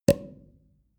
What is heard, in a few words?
sparkling wine cork open soft